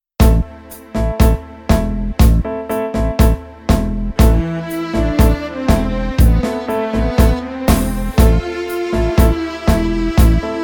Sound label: habanera sad january; ВидеоМОНТАЖ 23.0; ams music library